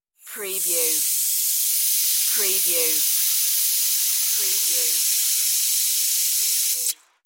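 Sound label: Compressed Air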